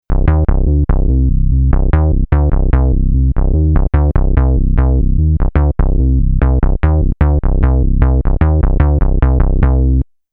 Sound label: Pulse Bass